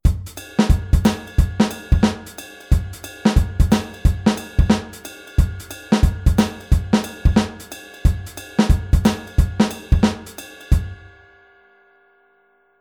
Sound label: Groove off; shuffle offbeat